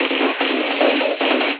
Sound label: Jungle Bumpers; Sonic Foundry (Sony Creative Software); Esoterik Beatz; Jungle Fx